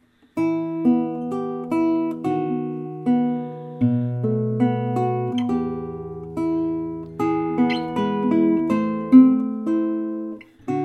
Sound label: guit